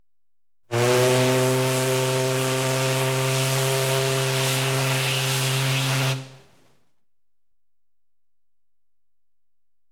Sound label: Paddle Steamer, Hooter; Ships And Boats; BBC Sound Effects Library; Ships And Boats 1